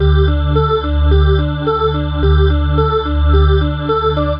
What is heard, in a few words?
MELODY; Chillout; Future Music